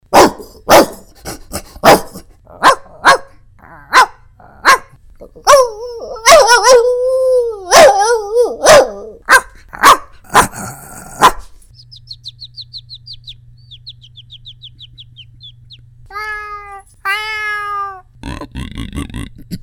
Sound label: DOGS & ANIMALS; voice matching